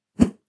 Swinging Axe

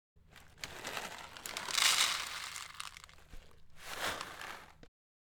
Pouring Cat Food Into A Plastic Bowl

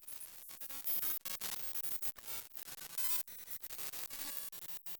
Sci Fi Computer Working; Another Whole Cd In 5 Seconds

